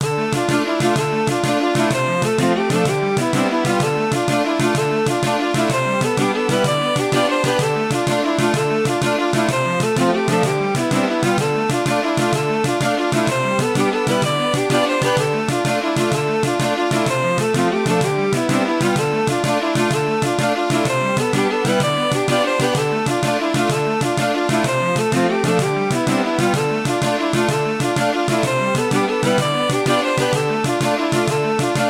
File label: Interlude